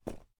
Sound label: Snow; Rebel Cops